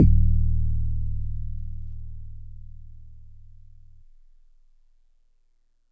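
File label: Marimba DX100 D#3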